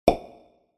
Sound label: pop